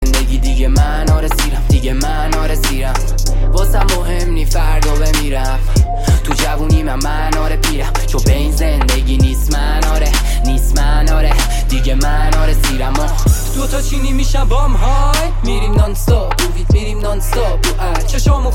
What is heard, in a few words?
Non Stop